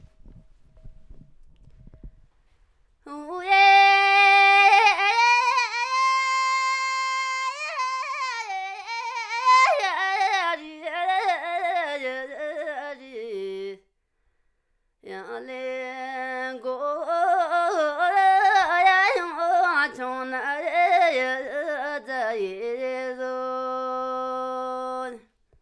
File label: Dkyil 'khor Folk Song